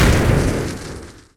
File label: fire impact; MagicArsenal